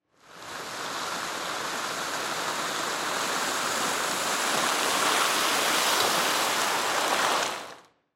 Hockey Goal Net, Hockey Goal Net Slide Move On Ice; Sports; Blastwave